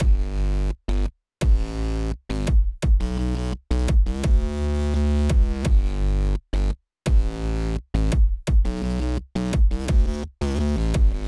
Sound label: Neutron Bass